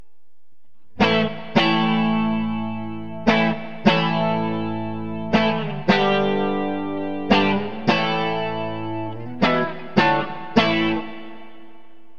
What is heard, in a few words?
bridge clean; classic57; Burny